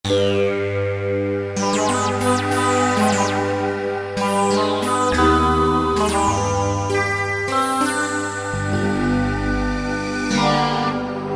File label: Android, Roland PN-D50; Roland PN-D50-01